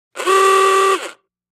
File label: Old Klaxon Car Horn, antique horns